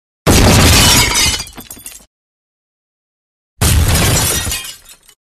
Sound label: Glass Smashes Multiple Very Fast Multiple Glass Impa; Hollywood Edge Foley Sound Library